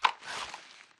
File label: empty reload mag grab; wpn car; Titanfall 2